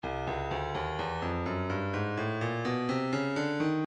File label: breakbeat midi files 125 129 bpm 126 disco; Cardboard Disco A; Stylus Breakbeat Midi Files